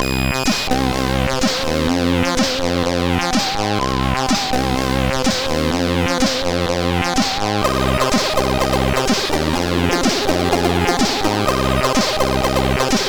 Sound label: Fem Laaten, CSG8580; CSG8580R5; HCL; hvsc